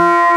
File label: ppg bellish, wavetables